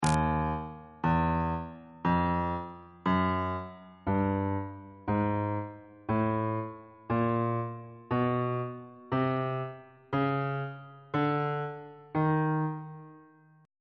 e flat locrian mode chromatic scale 1 octave on bass clef